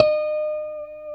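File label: E5 TAP harmonic, guitar; Spectrasonics; Hans Zimmer Guitars VOL-1; JC-TAPONICS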